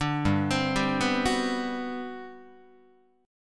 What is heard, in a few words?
G Major 11th down Guitar; arpeggiated; JGuitar